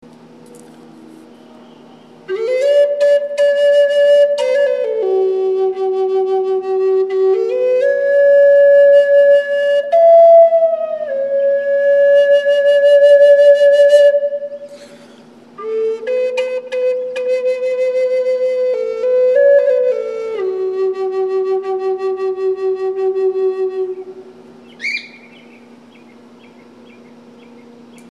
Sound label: NAF Bird Song, flute